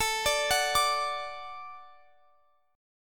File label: A Suspended 6th A